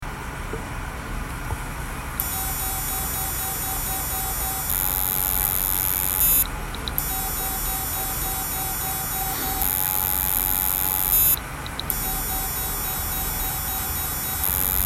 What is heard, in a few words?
stuck motor sounds